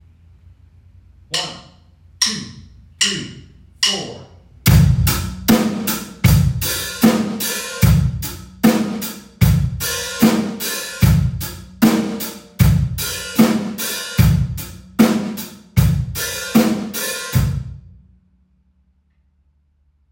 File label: hi hat techniques